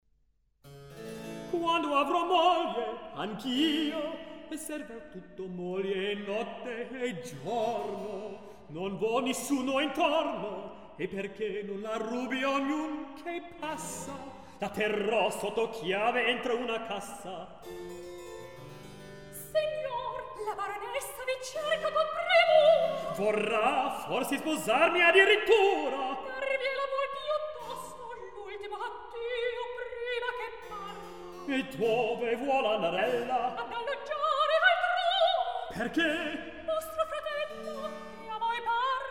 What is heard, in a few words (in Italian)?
Recitativo; Quando avro moglie anch'io; Mozart; La Finta Semplice